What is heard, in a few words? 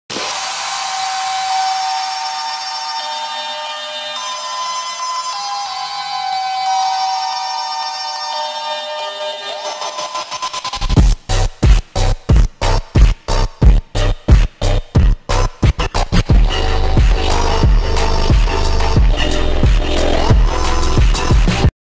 midnight phonk; baki hanma edit; anime